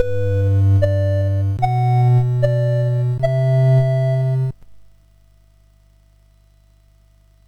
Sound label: sid MOS6581R4; Dark Lord